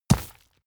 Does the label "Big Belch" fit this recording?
No